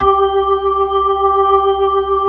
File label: B-3 Chorus